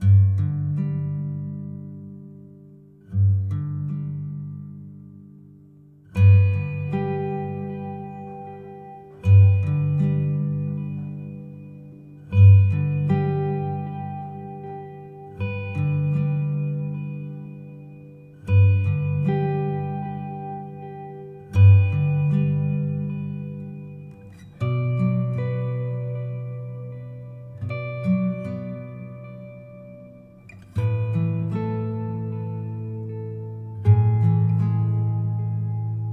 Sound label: Ambient, Guitar Stem; ps4 ps5 switch windows xbox one xbox series xs